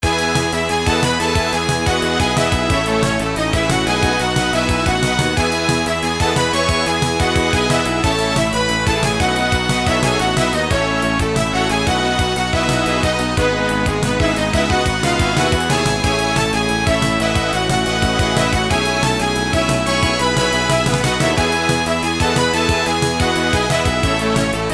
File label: title & menu; phone; fruit factory 2